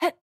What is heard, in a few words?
Attack Normal; Jackie